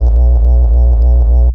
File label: Techno Didge; Single Hits